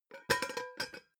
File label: pot lid close plop short; objekte; topf